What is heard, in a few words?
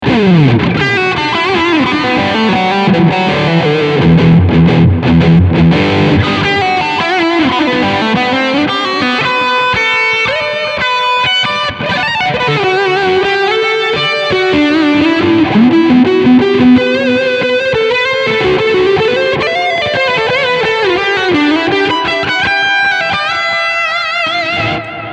medium delay overdrive; t-rex replicator